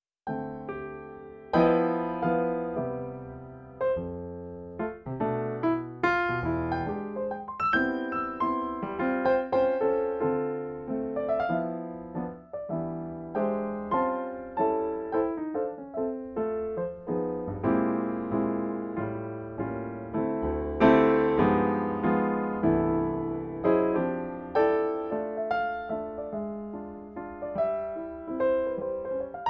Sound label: Steinway D